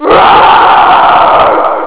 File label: ROAR